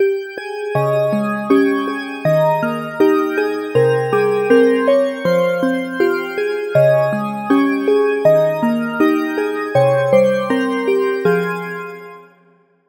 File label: Key